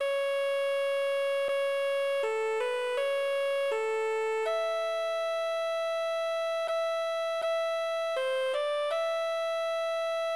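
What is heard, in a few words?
Soprano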